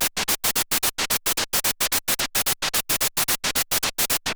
MiniBrute 110BPM, Gated Seq Noise

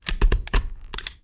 STAPLER; AKAI S-Series CD-ROM Sound Library VOL-6

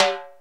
RX7 TIMBL HI; Crypton - DrumBase5000

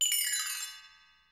727 ZITHER, TR-727; Hollywood Edge; The Big Bad Beatmachine

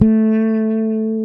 Fretless Bass

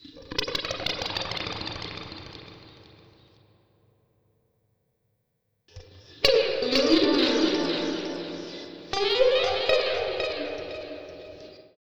Gtr D, Slo Mo Funk, Lounge; Zero-G Kocktail Kollection; Construction Kitz (Lounge)

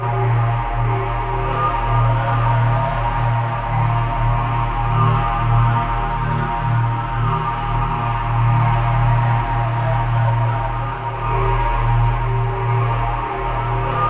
buzz bombs, drones; Spectrasonic Distorted Reality 2; DRONES 5

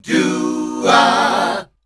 Voice Spectral, H PHRS; Best Service; Voice Spectral II

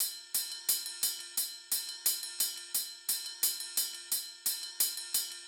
PRCLP, Percussion Loops; neuro; Stanza; Drums

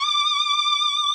HIP HOP; Rubber Chicken Software Sample Bank

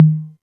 808 Tom Mid, Drum Machines; Novation DrumstationV1

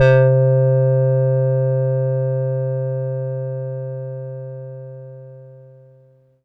Octaver c4, Korg Poly-800

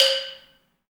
BALAFON; BALAMBO; Heart of Africa

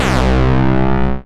Single Electric Basses, Dance Floor Bass

